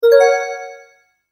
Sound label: multimedia tone advance